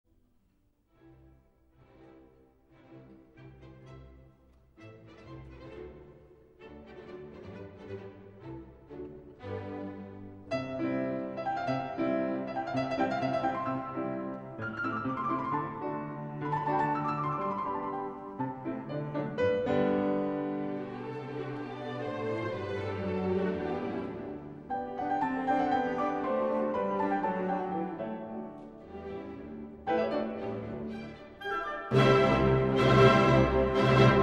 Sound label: Piano Concerto; Beethoven